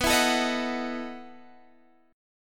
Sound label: E Major 7th Suspended 2nd Suspended 4th